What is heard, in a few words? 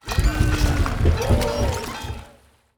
Dock (boat, war ship), completion